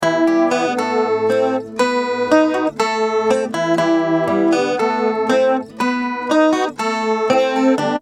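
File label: acoustic guitar next chorus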